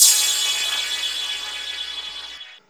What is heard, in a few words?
PROCYMB, CYMBALS